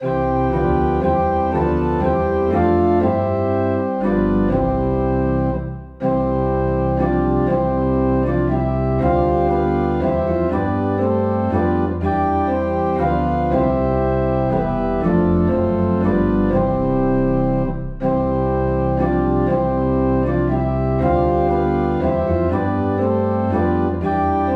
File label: Organ Low in C; Come Thou Long Expected Jesus